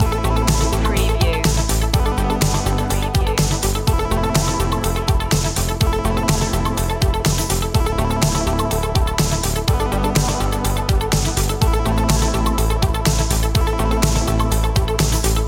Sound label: 8 bit skyway loop